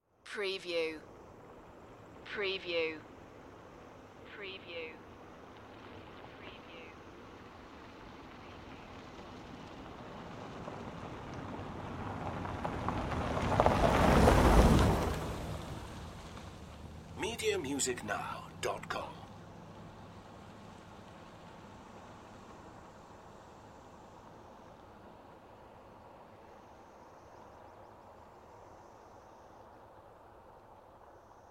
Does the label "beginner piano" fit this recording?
No